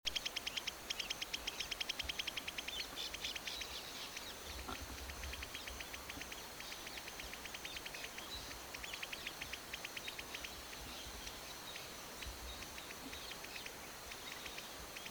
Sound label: Jungle Bush Quail Perdicula asiatica